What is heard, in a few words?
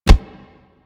Truffle Jugger Step Default; Warframe; Infested; Juggernaut; Truffle Hunt